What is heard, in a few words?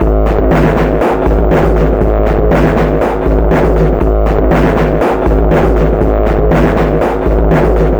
electrice 120bpm